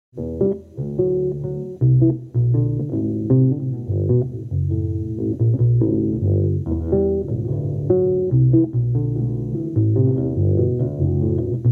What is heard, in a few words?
elastic guit